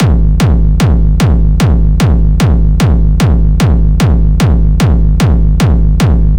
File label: Distorted Hard Techno Kick Loops G 150bpm